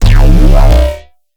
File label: grain boing, bass